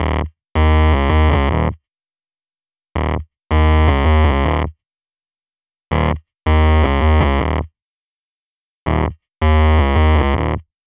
Fuzz Bass; Sonic Foundry (Sony Creative Software); Electronic Point Blank; Basses

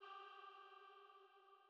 Female Pad G3

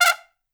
Trumpet Noise; Sonic Foundry (Sony Creative Software); Jazz Solos and Sections

